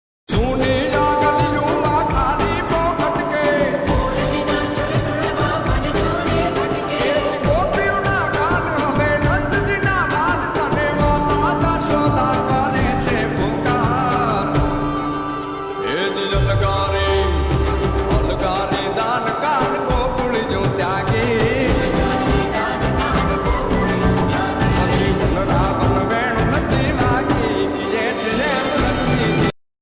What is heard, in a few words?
gujarati ringtone